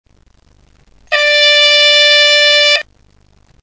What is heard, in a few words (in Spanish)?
bocina, Locomotoras; SONIDOS ADECUADOS PARA LOCOMOTORAS; TER